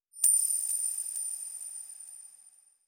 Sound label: Silver Coin Jingle; bayonetta 2